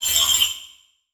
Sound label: Multimedia Internet CD-Rom Flash Buttons Electronic